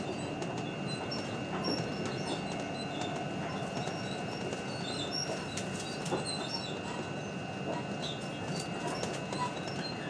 very squeaky train

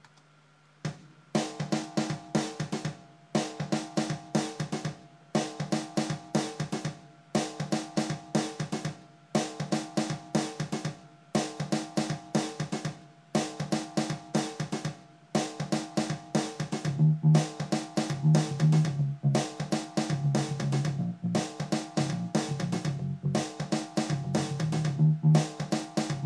plucky goodness and a bit of chilled out drums

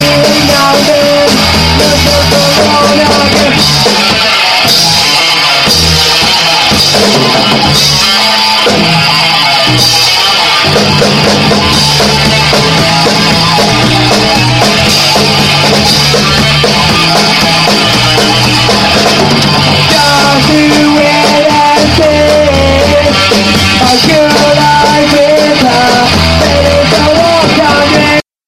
cover rehearsal

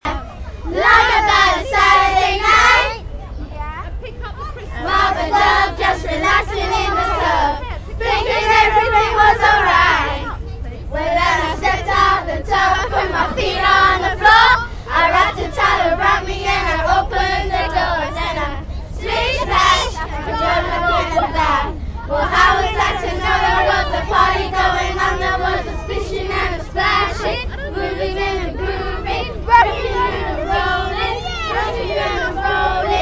singing outside the festival hall

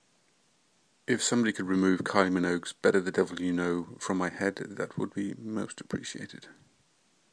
arrrrrrggghhh

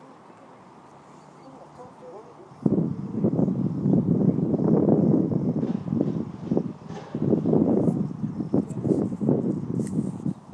ambient noise

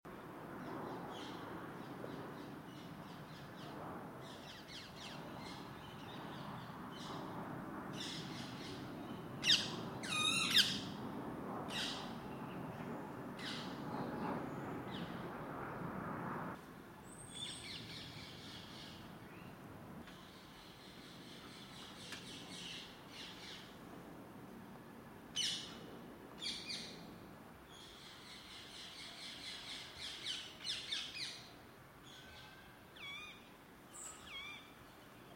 teatime garden birds, birdsong stereo

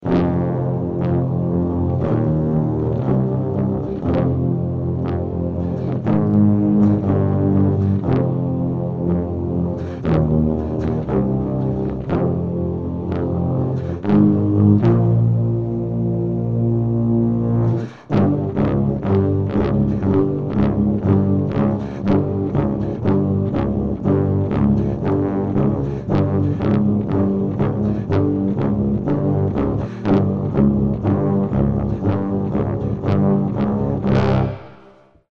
tuba ensemble

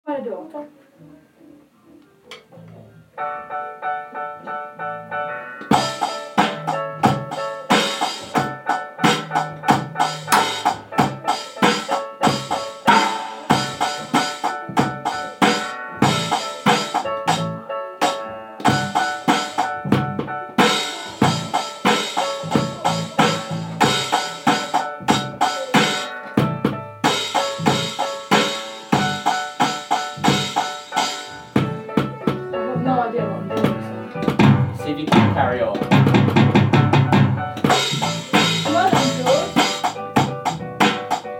very basic drums

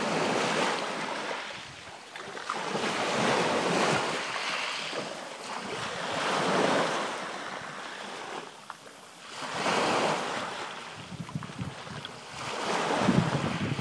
whitstable waves

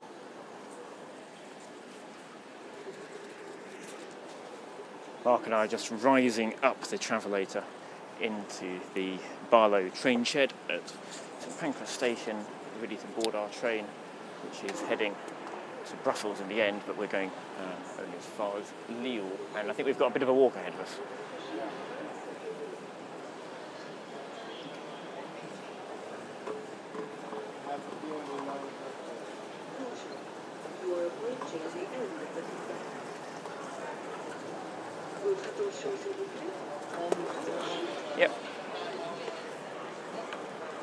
a trek through the trainshed boarding; barlow trainshed; eurostar

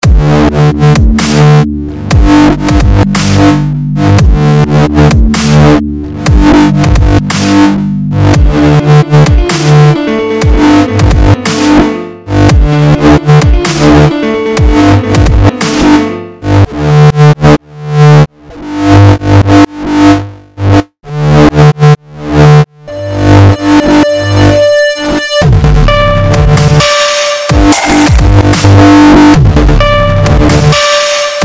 bass

